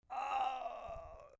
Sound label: Die Low Damage